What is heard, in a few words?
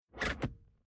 Transport Car Classic Headrest Front Seats Pulled Up Sliding; Bentley Eight; Slowly Low Pitch; Car Sound Effects